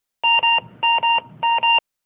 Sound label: wireless call; densetsu; signal